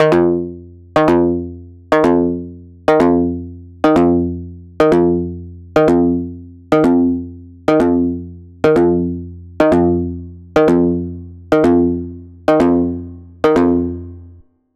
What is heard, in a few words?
Synth Guitar Pluck